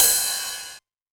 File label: 909 Ride, Drum Machines; Novation DrumstationV1